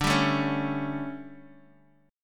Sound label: D Major 7th Suspended 4th, Guitar, DADGAD